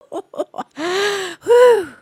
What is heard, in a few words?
laugh